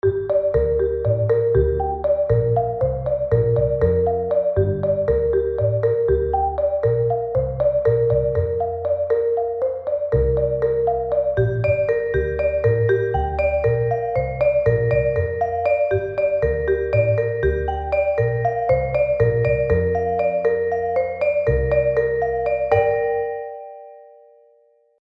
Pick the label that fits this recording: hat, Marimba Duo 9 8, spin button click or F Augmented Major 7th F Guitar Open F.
Marimba Duo 9 8